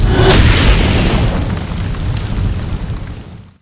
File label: fire pole die